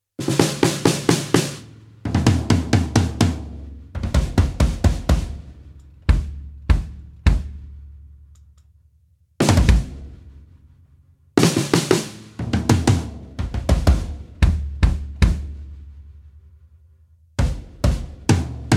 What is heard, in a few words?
solo low tuning